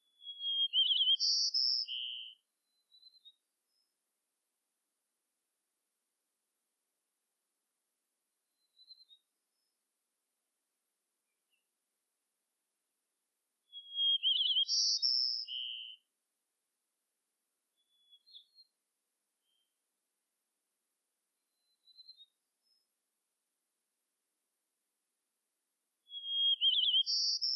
White crowned Sparrow Zonotrichia leucophrys gambelii